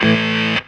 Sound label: GTR; USB Soundscan vol.32; Roots Disco; AKAI